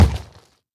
step; zoochosis; lazynap; dolphin deepsea monster

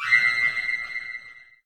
fall; pou

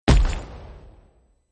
step; The Monster Plane; kolmods steps; cartooncat

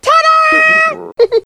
lunge scream loop; huggy wuggy snpc; elmo

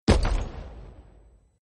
step; zoochosis; lazynap; dolphin deepsea monster

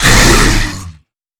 pyro attack; The Monster Plane; kolmods preatt

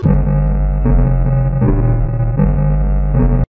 phase 1; Garry's Mod; sprunki jelly fish

